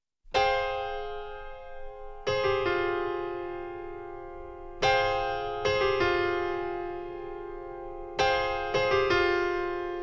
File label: Jaz (Stereo); Denon Audio Technical CD